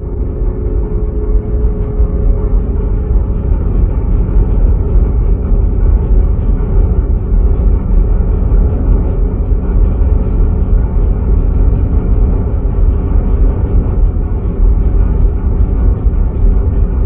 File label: large machine loop; ambience